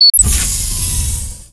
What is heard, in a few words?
mine draw, weapons